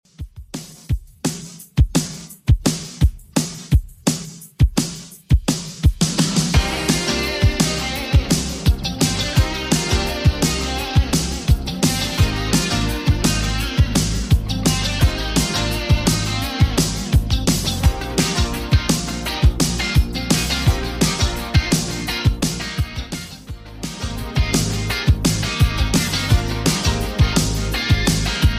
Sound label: 80s Redrum, 85bpm